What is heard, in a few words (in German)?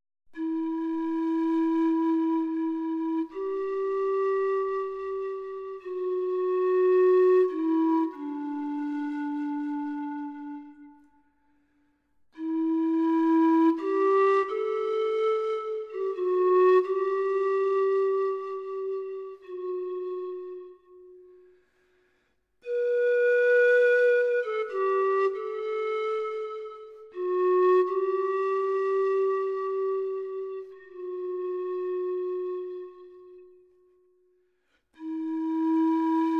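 Meditation für Panflöte solo, Pan flute music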